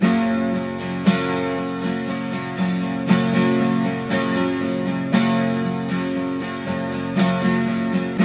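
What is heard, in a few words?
12 string major G, Guitars; Spectrasonics; Hans Zimmer Guitars VOL-1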